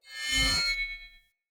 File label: Cleansed Spear Whoosh Accent Heavy; Weapons; Player